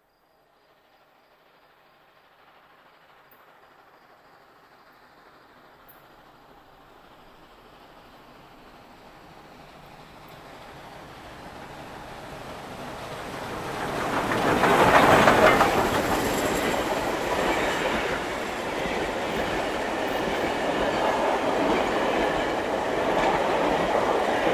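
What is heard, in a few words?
sound of a steam locomotive moving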